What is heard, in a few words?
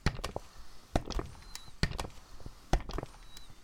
metronome